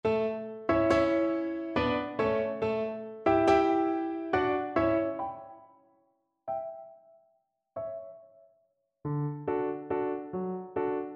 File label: waltz violin; viennese blood